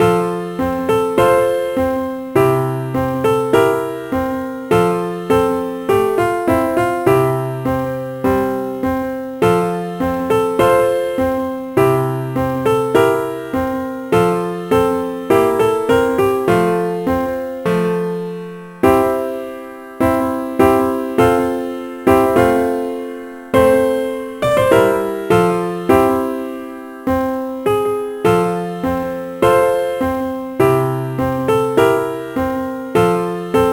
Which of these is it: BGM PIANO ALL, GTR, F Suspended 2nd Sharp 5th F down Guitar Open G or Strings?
BGM PIANO ALL